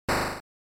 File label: General Noise Single